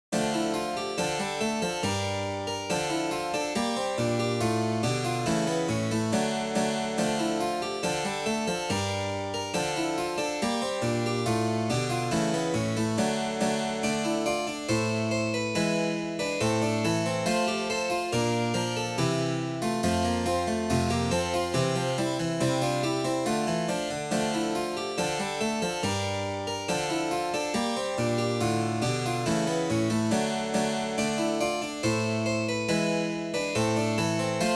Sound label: harpsichord, italian dances balletti of the renaissance; Fuggi